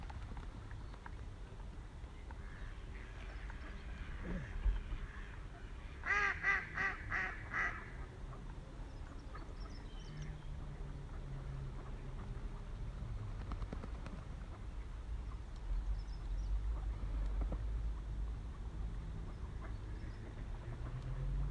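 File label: Balancing pond Bird calls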